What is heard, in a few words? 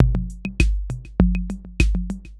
drloop, drumloops, Vinyl Attack; Masterbits